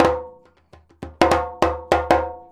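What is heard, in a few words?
100 JEMB, djembe; Univers Sons; Basicussions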